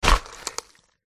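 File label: cerberus attack contact up; tserber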